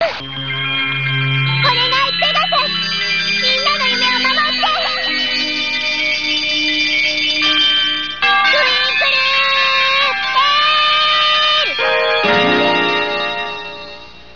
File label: twinkle yell